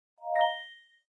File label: Sms Alert Samsung